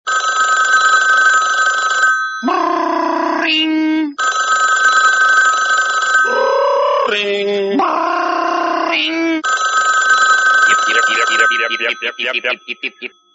yip yips briiiiiiing ringtone

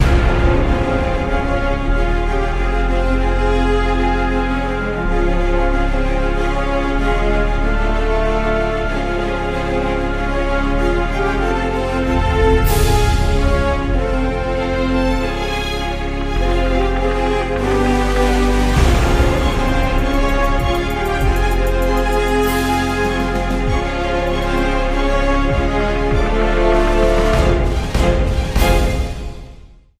ringtone; agents of shield

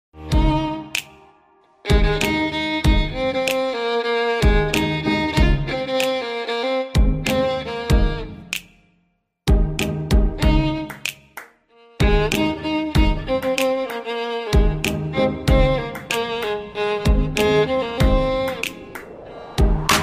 violin cover ringtone

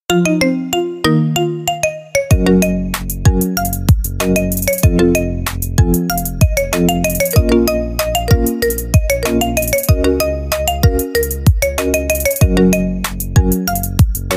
marimba remix ringtone

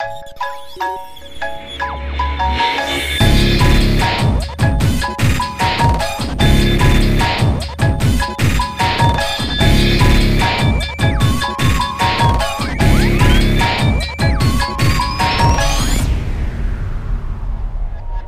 xylophone industrial rock ringtone

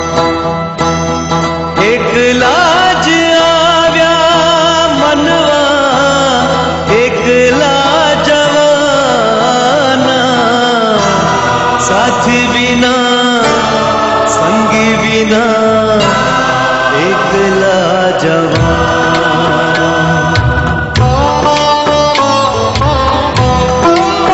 bhajan ringtone

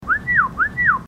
whistle ringtone; storror

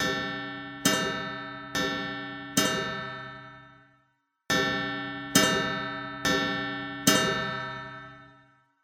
grandfather clock ringtone

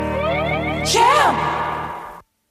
notify ringtone

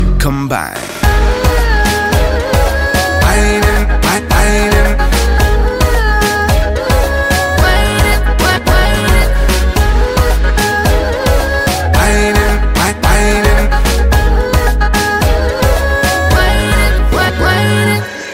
bongo ringtone

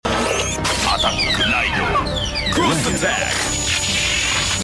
ringtone

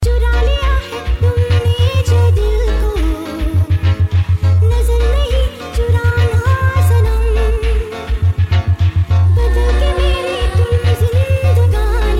india mix 2 ringtone

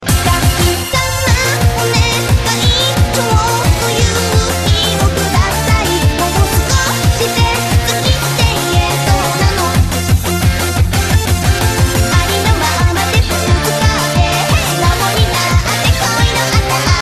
japanese love ringtone

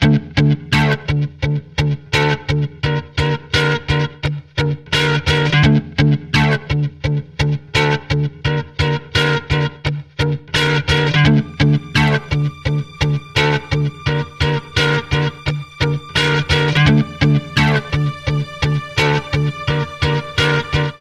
ringtone

